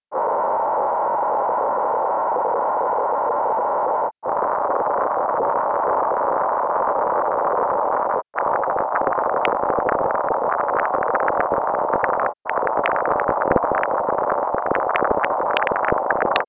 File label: augmented audification noise; deltaf600; kurtosis; skewness 0